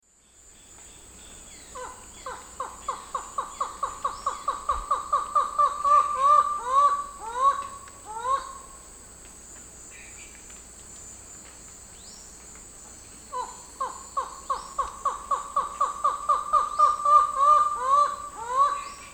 Micrastur mirandollei, calls; S. America; Eastern Lowlands

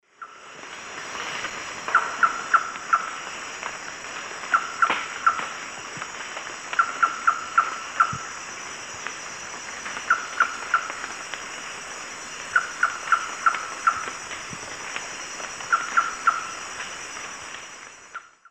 Trogon viridis, calls; S. America; Eastern Lowlands

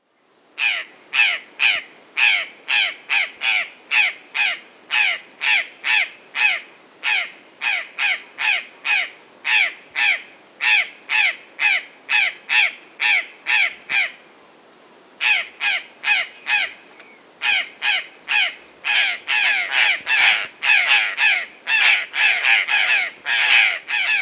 Purplish Jay (Cyanocorax cyanomelas), Peru; S. America

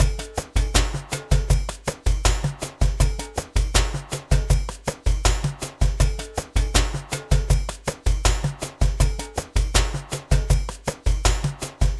JUNKYARD RHYTHMS

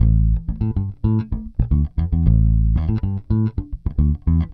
BASS X

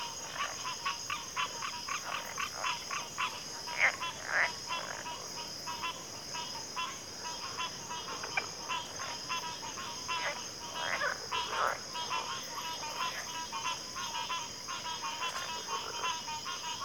Backwater Chorus, CRICKETS

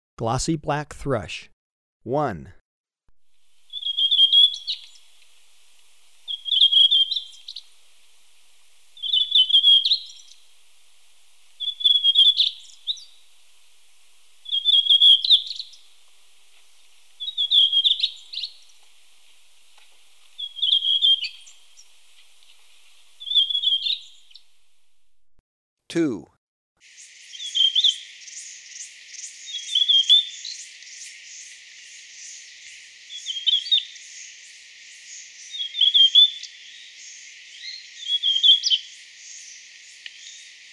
Glossy-black Thrush, Birds of Ecuador; S. America

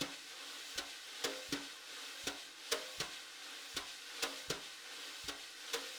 Drums, Jazz

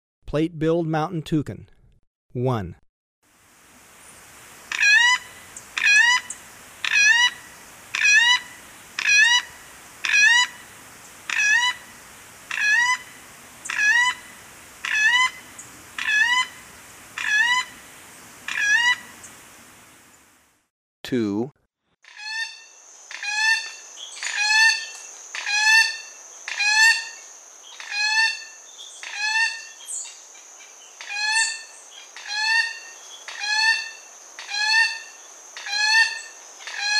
Plate-billed Mountain-Toucan; S. America

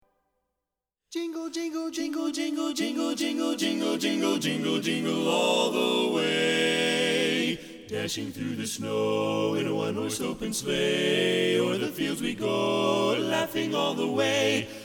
Jingle Bells, Christmas

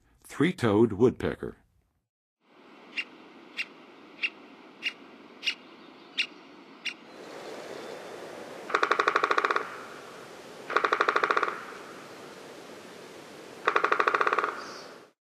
Three-toed Woodpecker; North America; Western USA; Western USA Songbirds

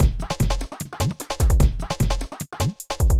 bitty 150

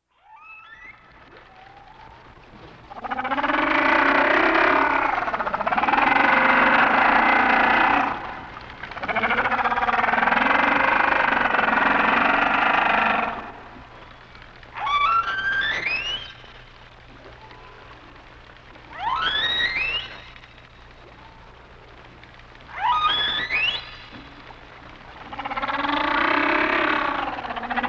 Sounds of Nature - Humpback Whales

